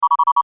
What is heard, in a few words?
Science Fiction Beeps Chime; Tech